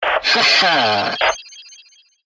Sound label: Event Unlocked